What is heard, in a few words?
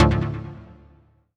Synth Shot D